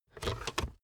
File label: car backseat table fold in fast